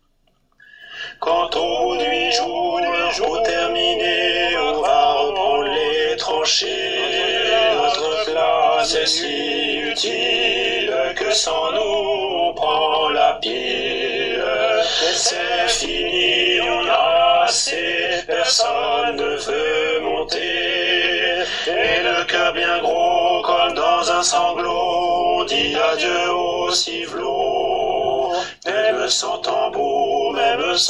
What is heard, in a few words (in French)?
alto